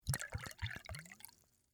Whiskey Pour, Foley, Whiskey, Pour, Into, Shot, Glass; Drink Mixing